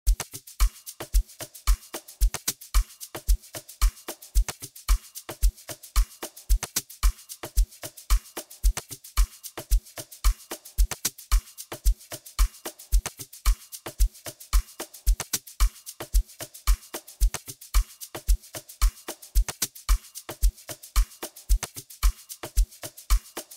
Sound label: Groove